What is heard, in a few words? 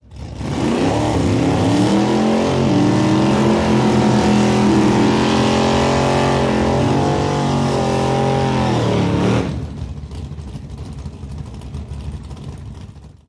drag race burnout, dragster